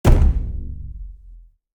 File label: RC Ball Hit; Scraprun; Deathrun